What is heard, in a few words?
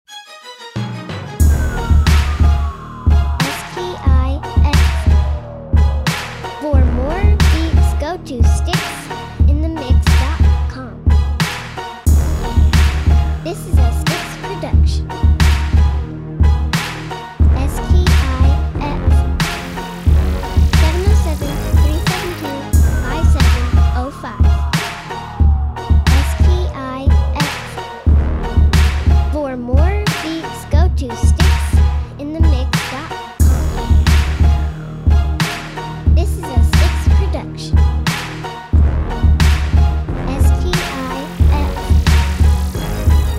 BATTLE CRY, beat sets